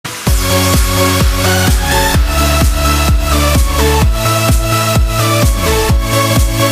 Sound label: win sound; pubg